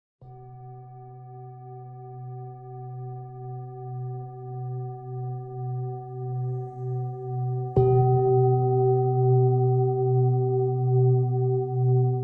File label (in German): klangschalen nabelchakra sonnenton; klangschalen chakra meditation